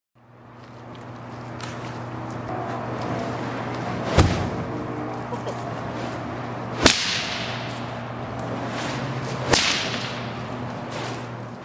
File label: fire whip